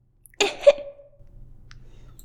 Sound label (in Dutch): hoest